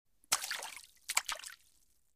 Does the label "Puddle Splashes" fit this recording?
Yes